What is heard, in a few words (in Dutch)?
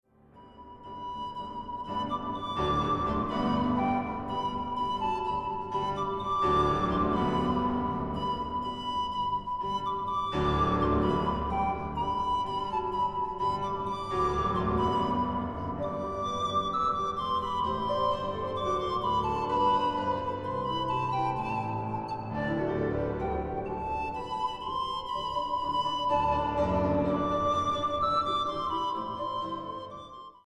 Panfluit en vleugel